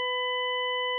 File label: even order through sixth